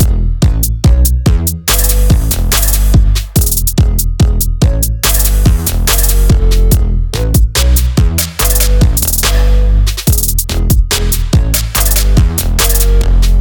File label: Emotes Music Pump Dance; fortnite emotes; Athena